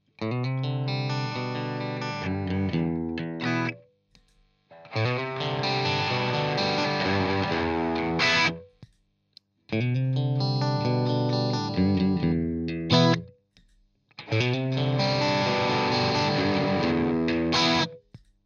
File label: Screamer Arps; JHS; CH1 negativ 12 Tone 12 Gain all positions